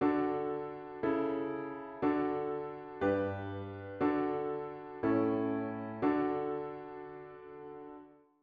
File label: modal prog